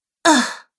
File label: Woman Groans Ugh; Groaning Sound Effects